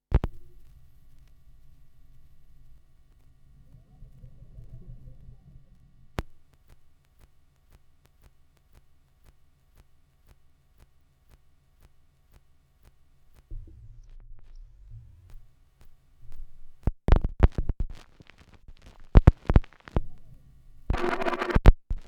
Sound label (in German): schallplattengeraeusche, Vinyl